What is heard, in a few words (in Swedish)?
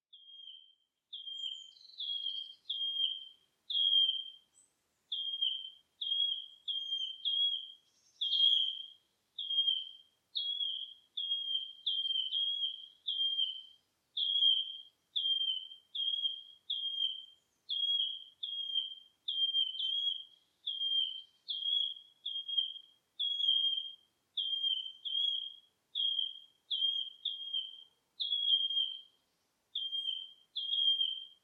grönsångare; fågelljud